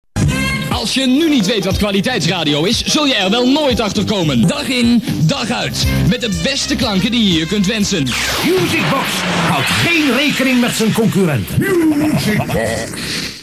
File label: musicbox; radiopiraten